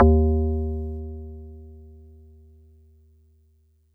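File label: Marimba DX100 D#3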